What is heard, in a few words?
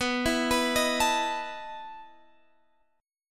E Major 7th Suspended 4th, B